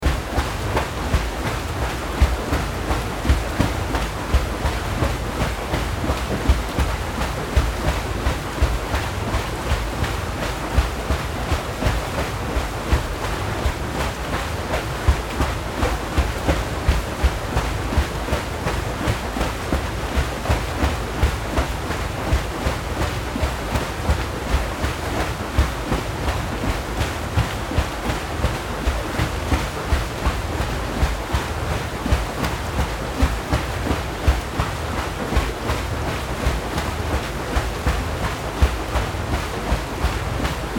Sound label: row boat